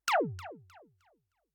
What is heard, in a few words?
laser; spacegame